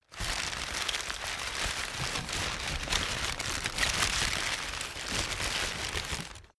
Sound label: stuffing paper take out long